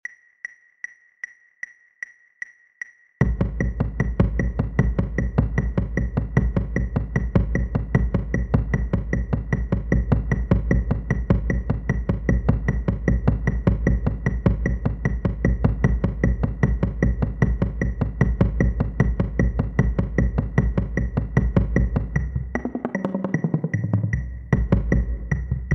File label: bass